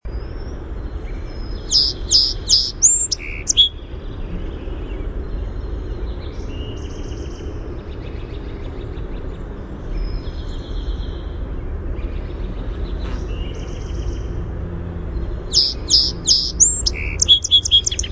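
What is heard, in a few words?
Song Sparrow song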